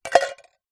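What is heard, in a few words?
tin can lid place inside empty tin; foley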